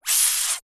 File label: human suck air through closed lips mouth